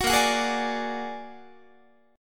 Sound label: Bb Augmented 7th Bb down Guitar 4A,4E,5A,5D,5G sharp,6C sharp; Bb+7; strummed